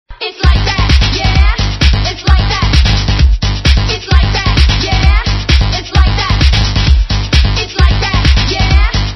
electro

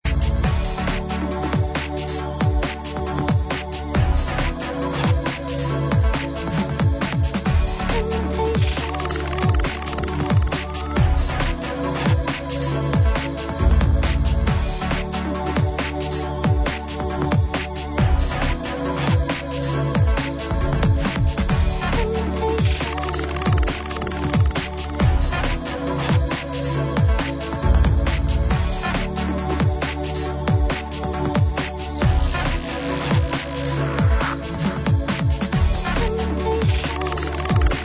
breakbeat remix, german female vocal

